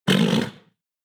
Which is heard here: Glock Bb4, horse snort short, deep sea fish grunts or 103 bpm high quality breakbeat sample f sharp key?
horse snort short